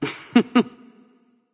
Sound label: hehheh; smite; nezha